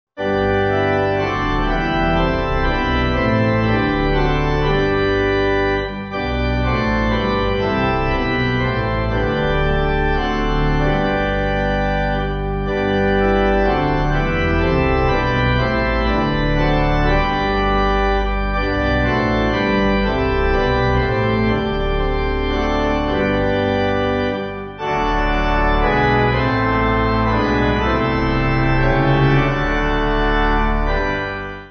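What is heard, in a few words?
Pipe Organ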